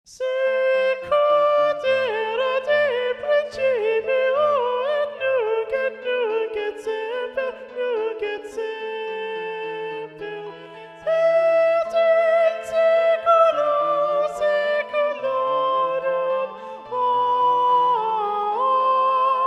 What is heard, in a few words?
soprano 2 predominant; sicut erat magnificat ssa; niccola porpora; ed. hunter